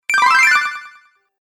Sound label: Game Echo Bonus, Video Game Sound Effects